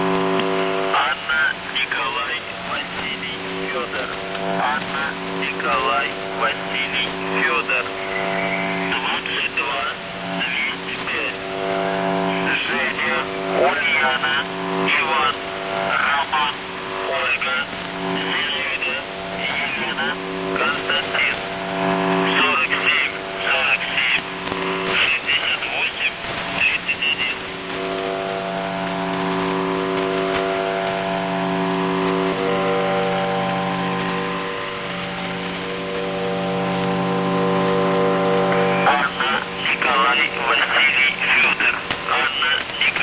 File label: buzzer usb msg